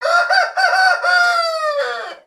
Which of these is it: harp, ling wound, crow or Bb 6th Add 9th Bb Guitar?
crow